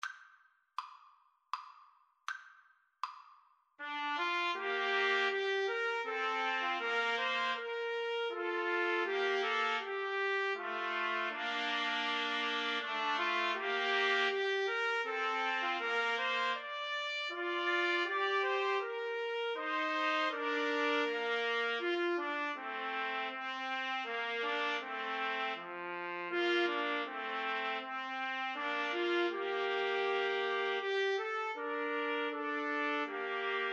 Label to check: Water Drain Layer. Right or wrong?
Wrong